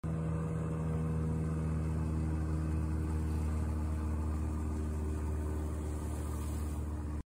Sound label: Cessna plane; هواپیما سسنا